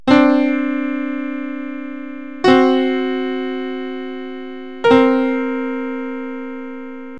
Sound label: Dissonance